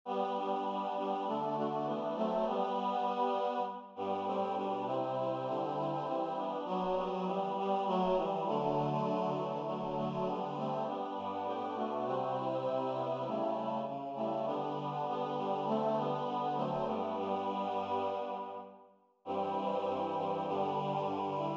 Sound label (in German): Maenner Acappella